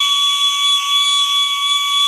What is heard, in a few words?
ney re